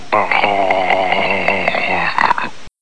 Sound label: Zombie Eating